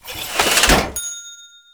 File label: fatman reload; garrysmod